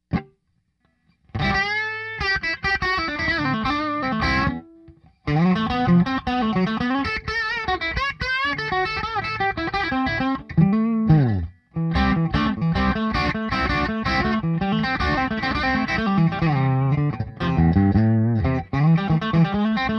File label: Bridge Pup